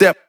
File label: vocal shot c#; HIDEO